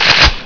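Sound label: weapon pickup; quake2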